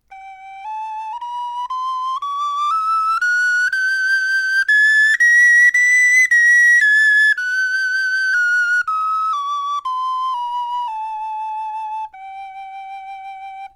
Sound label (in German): tonleiter